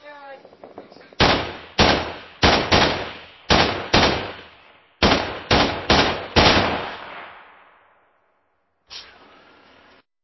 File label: rifle shots